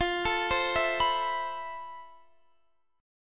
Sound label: F Major Sharp 11th, Guitar, Standard; FM#11; x,20,19,17,17,19; arpeggiated; capo=2